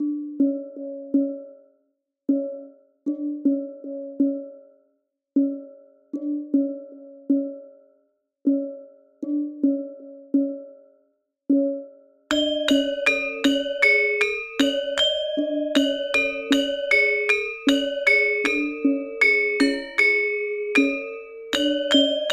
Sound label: Gamelan